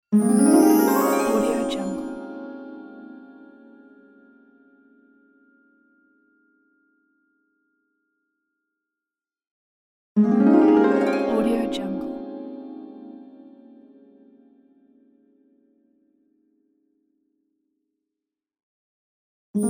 harp revealer

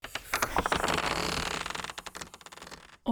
friction organic

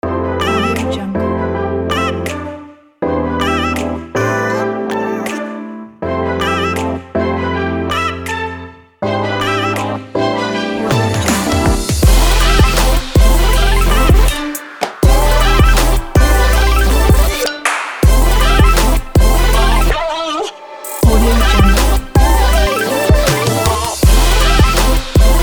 upbeat fashion rnb

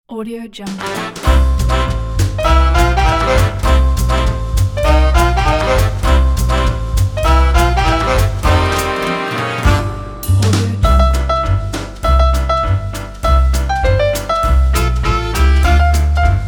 jingle bells jazz variation

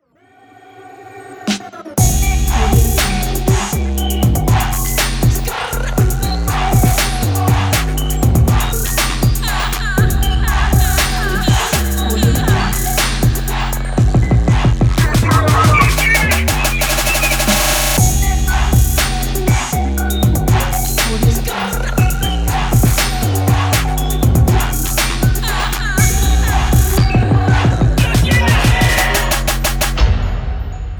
abstract rap beat; goodideaproduction

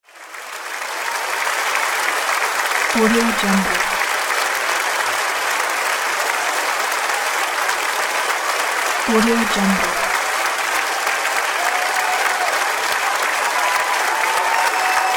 cheering and applauding sound effects

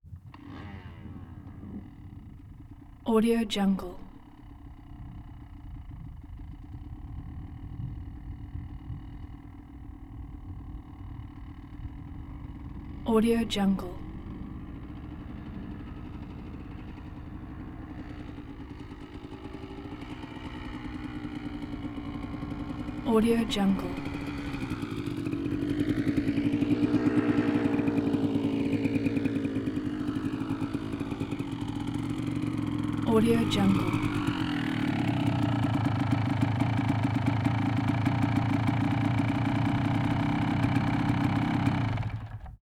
1991 kawasaki 200 motorcycle dirt bike

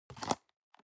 object plastic box food small open medium; smartsoundfx

